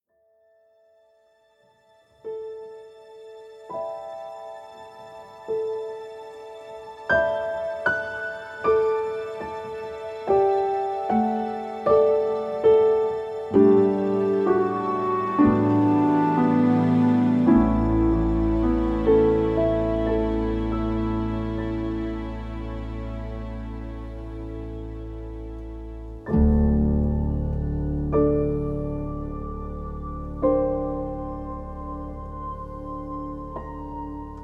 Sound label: instrumental